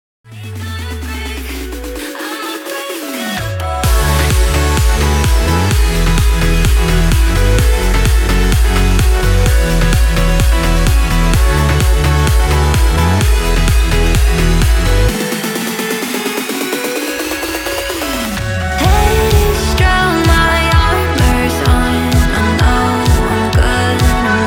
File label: Progressive 128 BPM